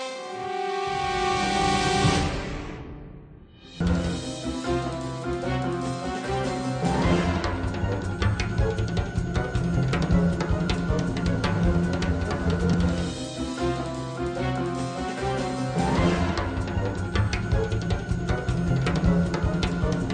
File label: music